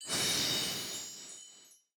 level up sparkle layer

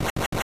spin; Super Smash Land

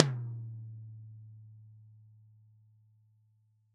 Tom 8 Inch, Drums; One Shots

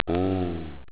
die

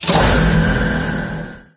hoverboat start up; There; ThereClient

